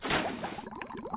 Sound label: flask fill